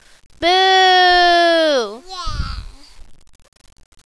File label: booo